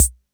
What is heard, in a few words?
Roland CR-78 Kick Hat; classic machines; Reverb Roland CR-78 Sample Pack